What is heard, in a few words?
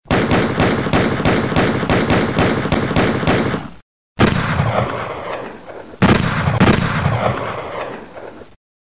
Sms Gun Shots